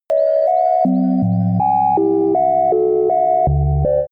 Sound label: vertigo drug